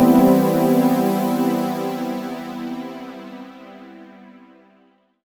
Chord; Blu Mar Ten; Jungle Jungle - 1989 to 1999 Samplepack

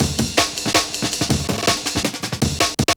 Stepper Amen; Jungle; Breaks; Blu Mar Ten - Jungle Jungle - 1989 to 1999 Samplepack

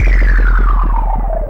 Blue Water Spin, FX; Jungle; Blu Mar Ten - Jungle Jungle - 1989 to 1999 Samplepack

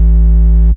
Bass - 8A, Jungle; Blu Mar Ten; Jungle - 1989 to 1999

